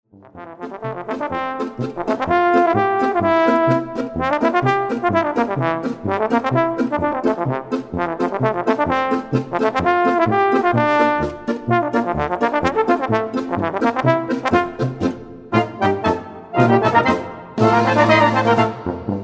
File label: Polka